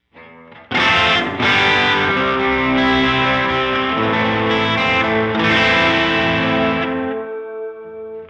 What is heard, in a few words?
electric; Cluster Delay; CHORUS